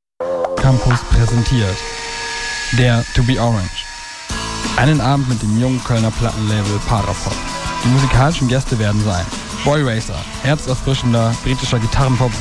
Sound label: campus jingle